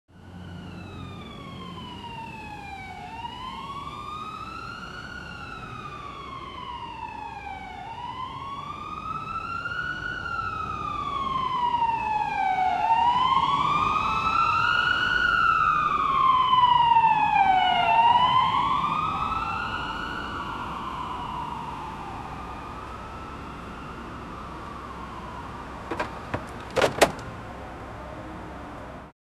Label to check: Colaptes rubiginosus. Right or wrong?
Wrong